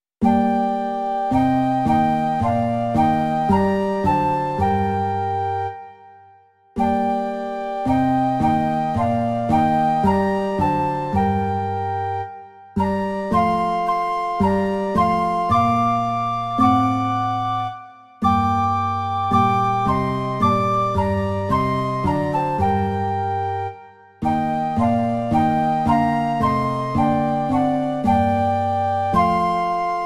2 flétny a loutna; Přej, Bože, pokoje nám všem; Imaginativní verze

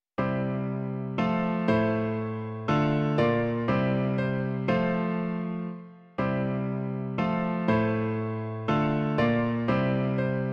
Sláva, sláva! Pán z mrtvých vstal! - klavír; Klavírní verze

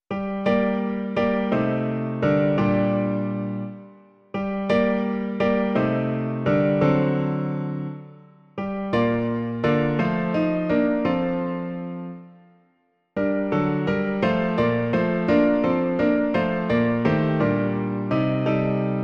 klavír; Klavírní verze; Buď Bůh můj veleben

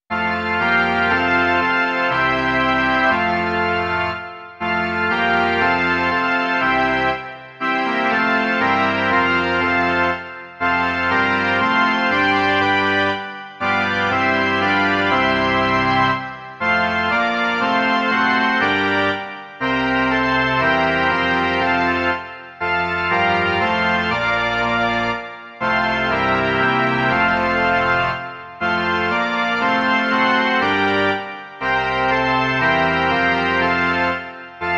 varhany; Dej, Otče milý

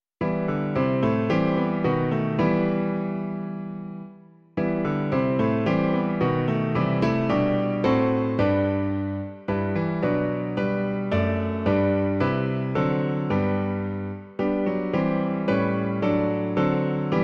klavír; Klavírní verze

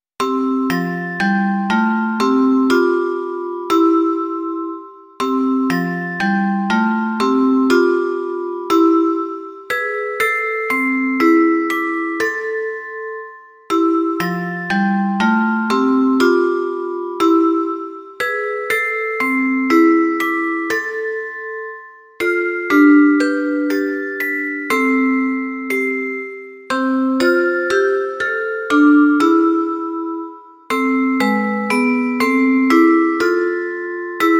celesta a vibrafon; Ó požehnej nám, Pane; Imaginativní verze

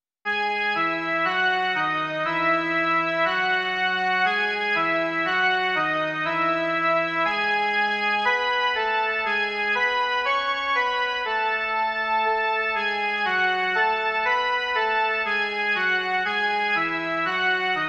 Už nám večer padá jen melodie

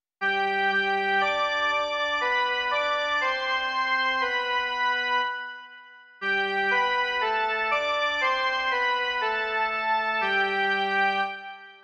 jen melodie; Bože, jenž jsi Krista nám dal

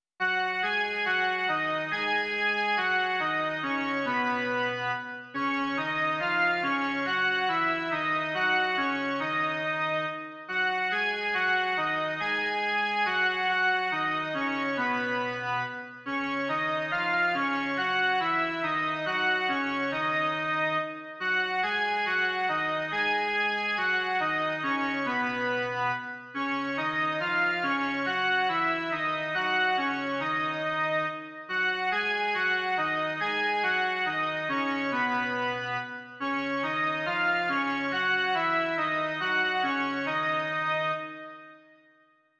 Blahoslavenství v době vánoční - jen melodie; Doprovody Farského liturgie

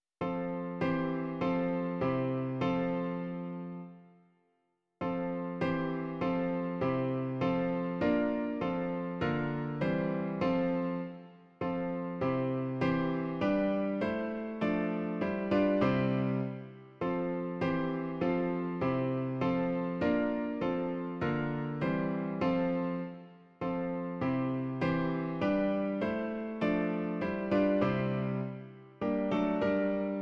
klavír; Ó vejdi, Bože; Klavírní verze

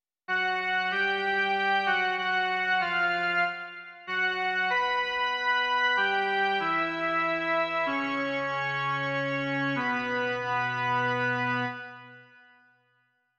jen melodie, Doprovody liturgie; Věčná paměť; Doprovody Farského liturgie

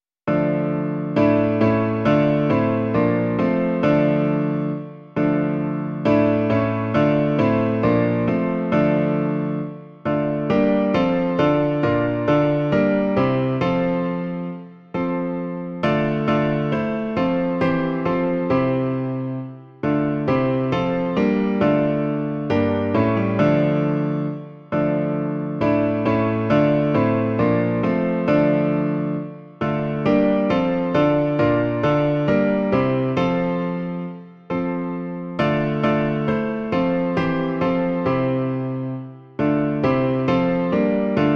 Radujme se vždy společně - klavír; Klavírní verze

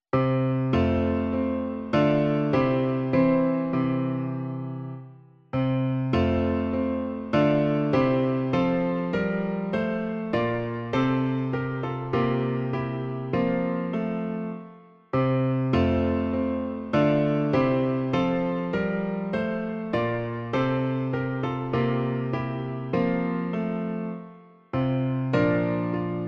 klavír; My k Tobě jdeme, Ježíši; Klavírní verze

